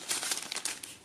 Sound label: money